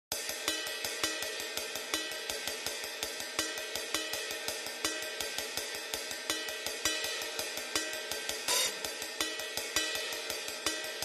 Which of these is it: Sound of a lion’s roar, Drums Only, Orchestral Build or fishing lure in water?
Drums Only